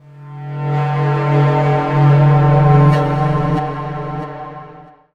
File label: TECHNO FX 140